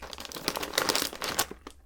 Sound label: take cracker out; eating